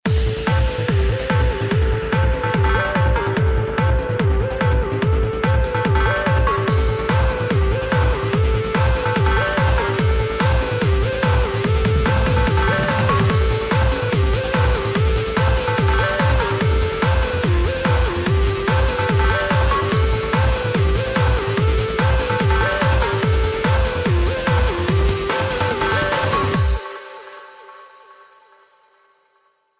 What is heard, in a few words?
Combat Bgm End; MicroVolts Recharged